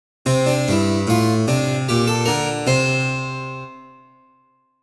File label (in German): mixolydische skala